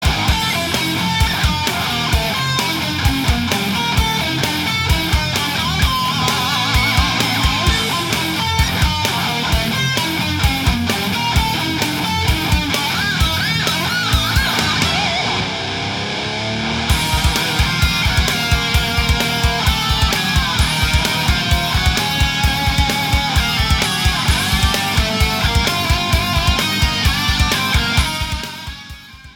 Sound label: drummer man